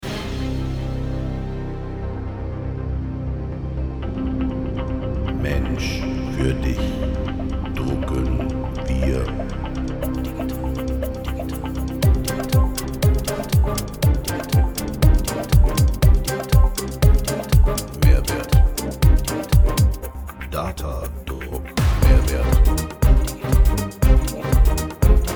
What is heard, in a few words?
sound Remix, Klingeltöne; DATADRUCK